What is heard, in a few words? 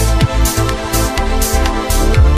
mute the kick